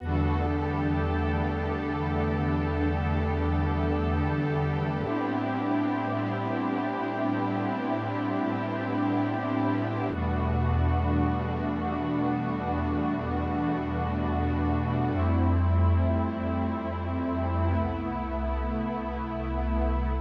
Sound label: warm pad A, Smooth RnB, Emin